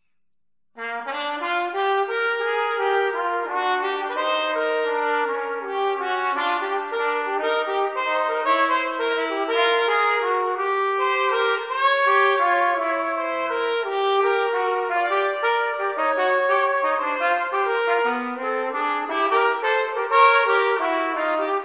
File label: slow blues